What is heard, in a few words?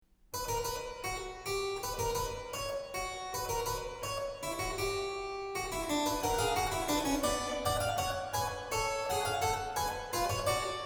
fugue harpsichord